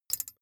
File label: thin metal boing vibrate short; industrie wirtschaft; metall